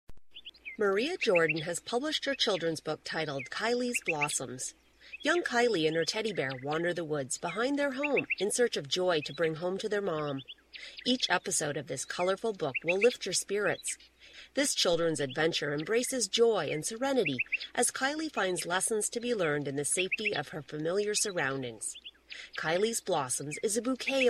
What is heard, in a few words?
birdsong